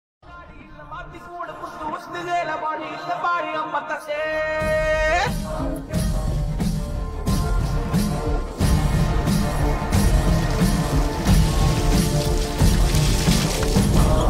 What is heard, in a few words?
temple bgm background music; virupaksha